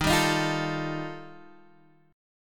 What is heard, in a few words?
G 7th Suspended 2nd Sharp 5th D sharp